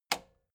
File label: metal button spring push